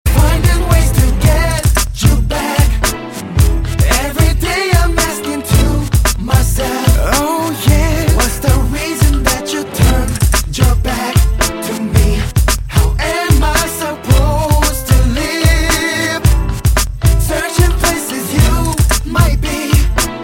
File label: Sad Tango